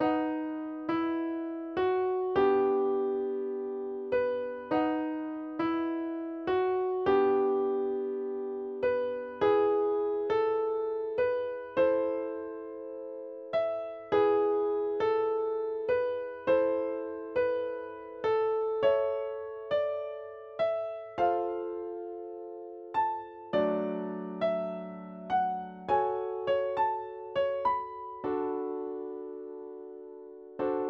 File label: instrumental